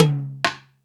FX Sounds, Drum Machines

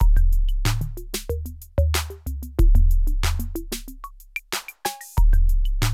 808 LOOPS; Kid Nepro - Elite

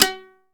HALAM PICKS; Best Service; World Colours

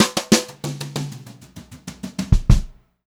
brushed drum loops, fill; USB Soundscan vol.39; AKAI; 90 s Sample CDs; 96 HOTROD

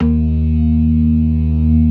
organ synth

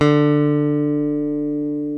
ELECT. BASSES; OMI - Universe of Sounds

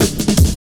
Drum Stepz Cut, Drum Loops 161.5; Zero-G Jungle Warfare 1, 2 & 3

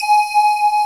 LINEAR SYNTH

